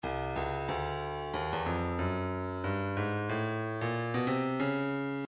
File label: breakbeat 092 bpm; Stylus